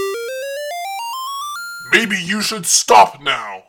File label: jingle stop